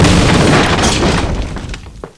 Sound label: crate, impact